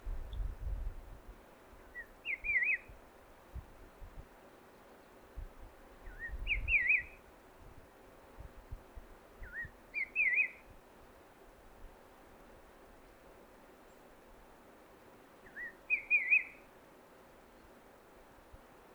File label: Elliots Laughingthrush; Angsai